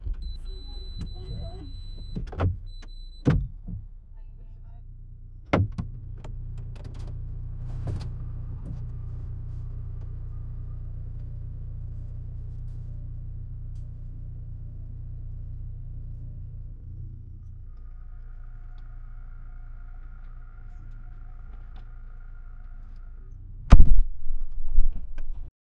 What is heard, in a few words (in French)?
bruit d'ouverture portière, exterior